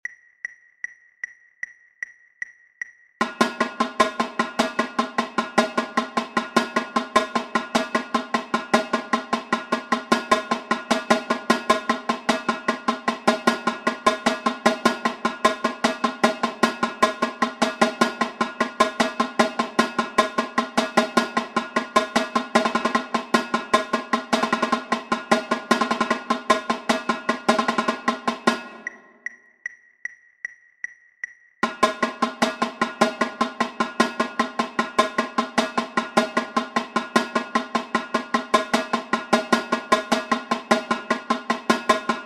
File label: sequence tenor